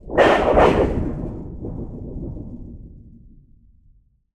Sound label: Metal Large Sheet Oscillating; Sound Spark LLC; Metal Hits, Scrapes and Squeaks